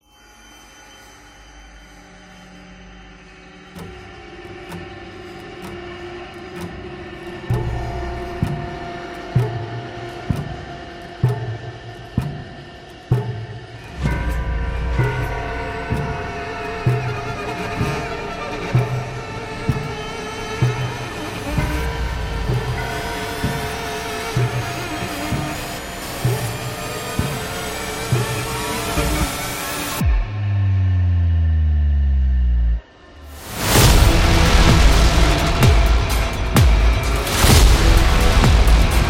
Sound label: Frightening